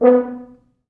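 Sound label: French Horns staccato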